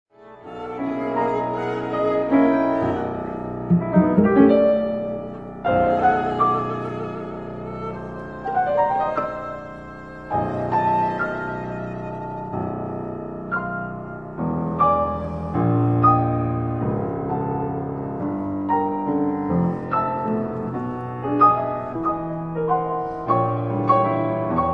Trio for piano, violin & cello Allegro vigoroso, passionato, cantabile